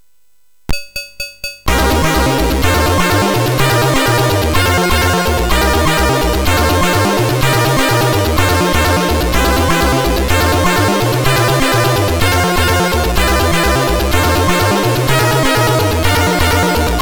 Music Demo Version, MOS6581R3; hvsc

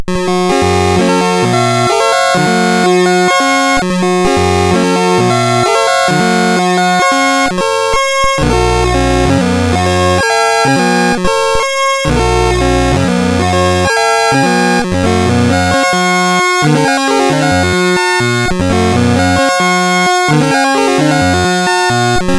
Hoedown, sid MOS6581R4; Mini Hoedown BASIC

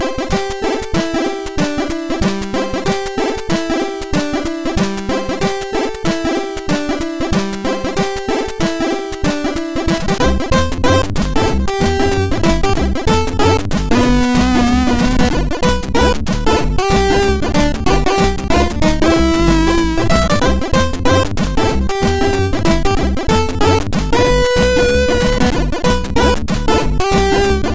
Christmas Boogie, MOS6581R4

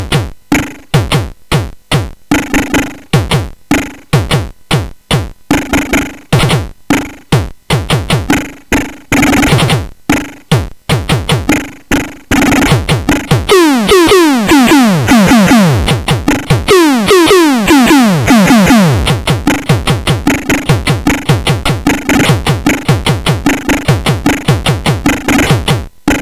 Drum demo; CSG8580R5; hvsc; Non JCH Newplayer

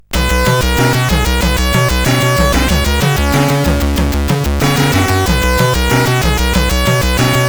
Experiment, CSG8580R5

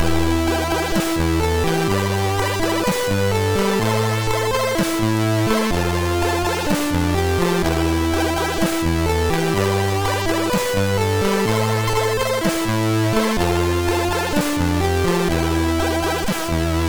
remix, sid MOS6581R2

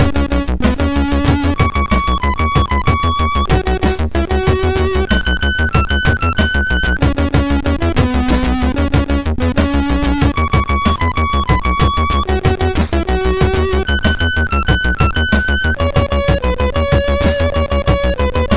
Intro Music, MOS6581R2

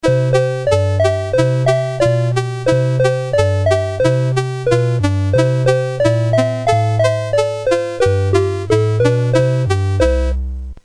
Yankee, CSG8580R5; Jim Butterfield

